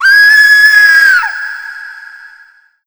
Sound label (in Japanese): himei kyaa reverb; 女; 悲鳴５ きゃーーー!!!（響; リバーブ版; 甲高い悲鳴 裏声; ホラーボイス・声ネタ・効果音・ＢＧＭ